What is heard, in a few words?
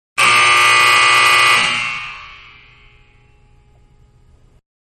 Basketball Buzzer sound, Indoors; Sports; Manhattan Production Music